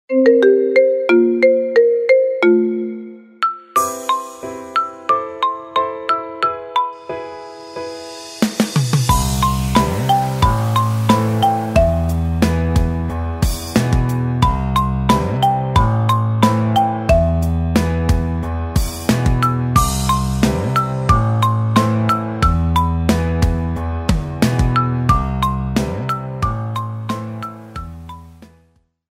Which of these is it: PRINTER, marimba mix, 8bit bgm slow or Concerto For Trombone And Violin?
marimba mix